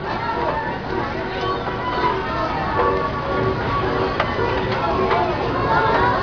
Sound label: people; carrusel